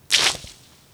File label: mine deploy; endless sky